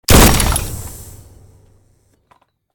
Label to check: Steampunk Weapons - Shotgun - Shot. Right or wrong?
Right